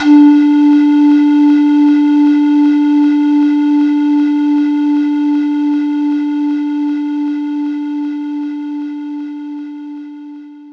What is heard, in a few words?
Chiff Flute